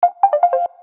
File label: warning alert dialogue; Samsung SGH-T369 Linx